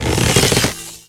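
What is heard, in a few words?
Slingshot Stretched; angry birds star wars 2 android ios 2013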